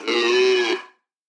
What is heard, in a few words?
antelope angry